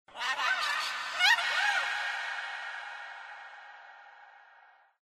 animal call bird weird; Source Engine; tf; lair

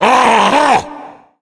growl; Left 4 Dead; Source Engine; video games; tank

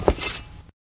black tile, footsteps

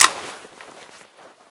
rifle clip out; Left 4 Dead; Source Engine

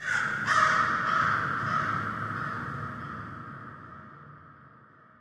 amb crow; source engine; portal2; birds

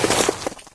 ice, player footsteps

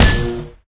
batsaber hit flesh

